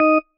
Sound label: marimba tissue; ゆめ2っき